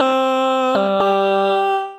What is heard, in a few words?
Chromatic, Transformed Voices; Candyland; Voice Box